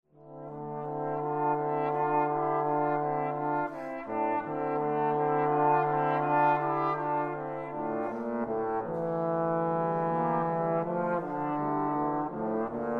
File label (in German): Quartett, trombone; Apon